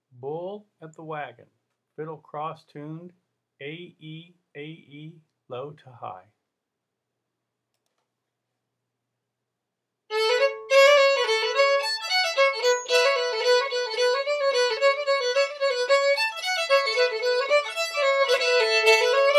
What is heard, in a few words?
fiddle; festival tunes